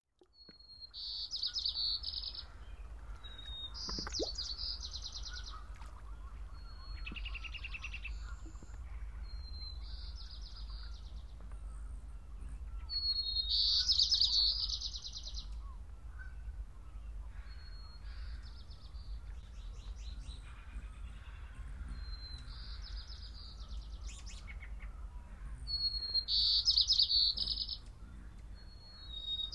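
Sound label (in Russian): Zvuki chistoi prirodi, sounds of clean nature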